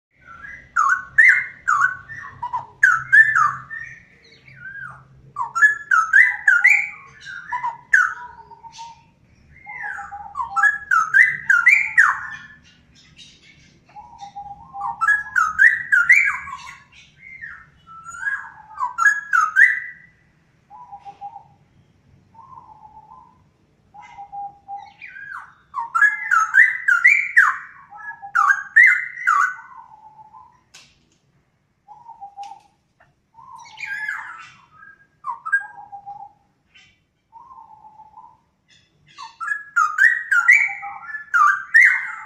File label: Straw headed bulbul; silentforest